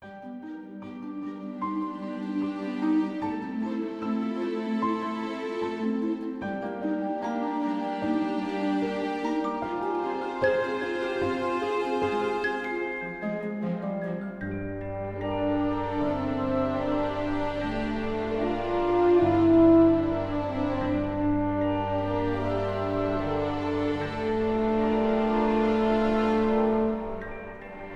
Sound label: forest music